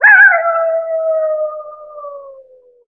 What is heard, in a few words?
wolf; Call of Duty Modern Warfare